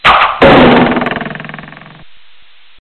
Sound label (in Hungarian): nyilvesszo